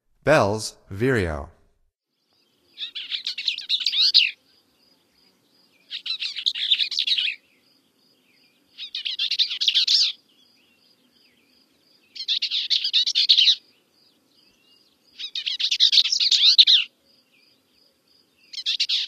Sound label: Bells Vireo, Songs of Birds of North America; Stokes